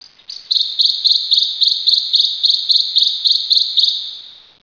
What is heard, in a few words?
bird